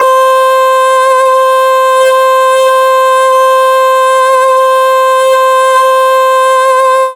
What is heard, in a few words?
Voice C; Synthetic's Serum Collection; Aubit; ChainPop Vol. 1